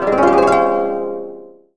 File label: koto gliss G3; AKAI S-Series CD-ROM Sound Library VOL-7